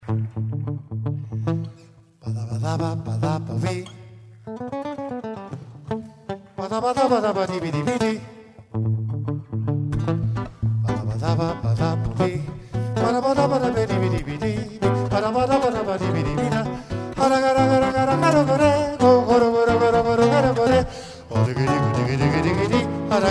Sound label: candombe